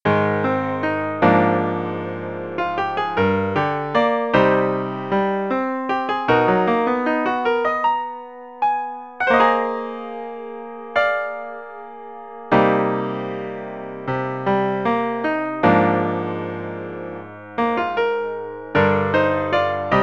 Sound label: piano accompaniment